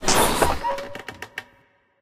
switch release; video games; buttons; source engine; portal2

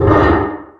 metal frame groan; Source Engine; Portal 2

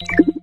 portal enter, portalgun; Source Engine; Portal 2

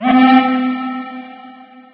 apc alarm loop, alarms; ambient; source engine; hl2

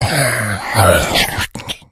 death, infected

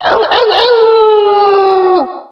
werewolf, halloween; Source Engine; tf

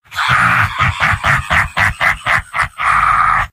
soldier laugh long; mvm

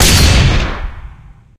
shot, weapons; widow maker; tf

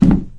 wood box footstep

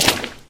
paint, footsteps

